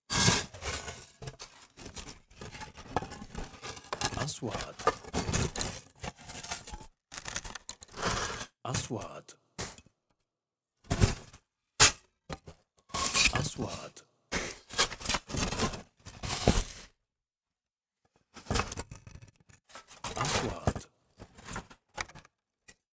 حفر يدوي بأرض رملية; sound effect; رمال

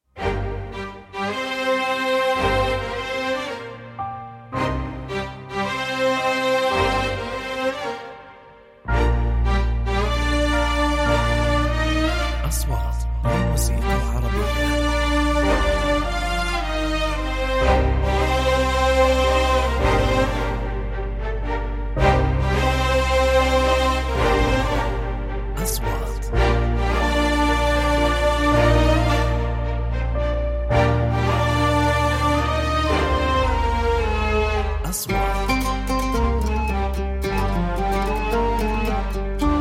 موسيقي وطنية

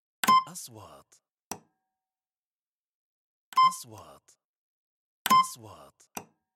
نغمة كاشير, transitions

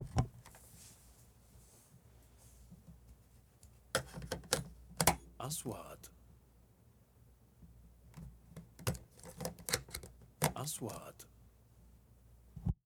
صوت تركيب عدسات التصوير; shooting

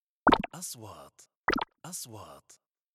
نغمة نقره مائية; transitions

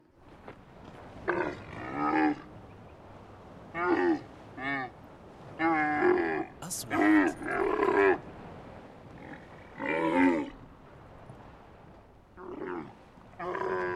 صوت جمل; sound effect; رمال

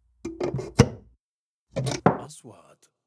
خلع مسمار; sound effect

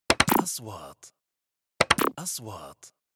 نغمة نقره الكترونية, transitions

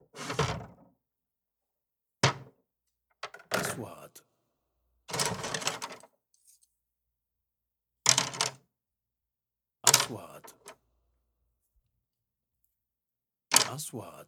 جمع الواح خشب, woods; sound effect

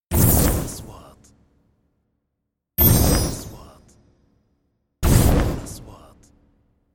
نغمة اندفاع هوائي سريع; transitions

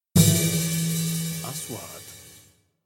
إنتقالة ختامية; sound effect; transitions

